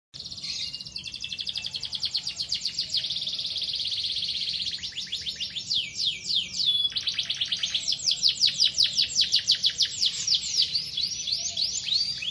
kicauan kenari; van hellsing

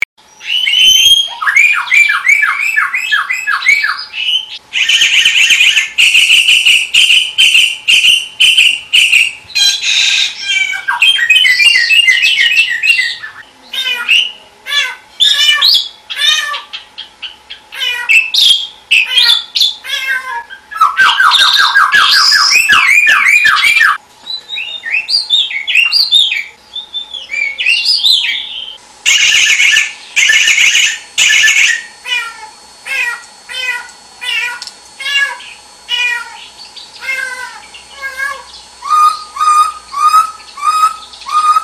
suara burung samyong rumahan

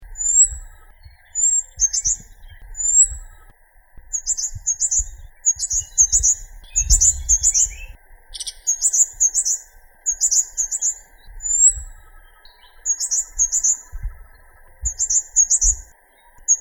suara cabai gunung

